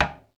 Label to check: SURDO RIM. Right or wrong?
Right